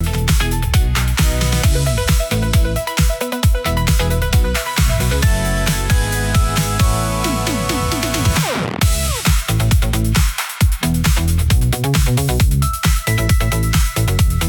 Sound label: loop